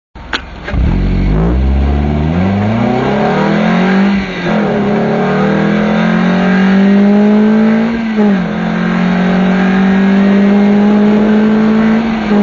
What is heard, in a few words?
tm drive, exhaust